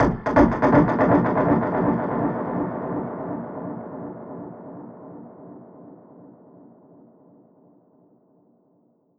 Perc Hit, dub percussion; musicradar

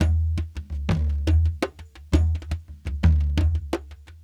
brekete, large darbuka

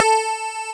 SYNTHESIZER DIGI DECAY

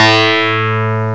CLAV ORG

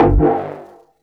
bass; EZRollers

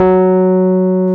piano Rhodes

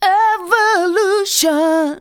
vocals sung; Techno Trance Essentials

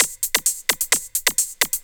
130 addon, DRUMLOOPS, HIHAT

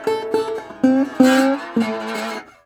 Blues Guitar Samples; ILIO - Fretworks

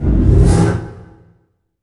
IMP FLAME, Cinematic Impact

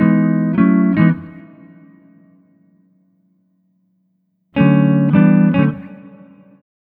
Multi Guitar Swing Gtr-E, 105; Zero-G Kocktail Kollection; Construction Kitz (Lounge)

